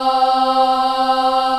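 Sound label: C3 child ah right, Choir; USB Soundscan vol.28; Acoustic & Synth; AKAI; CHILD AHF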